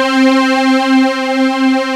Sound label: STACK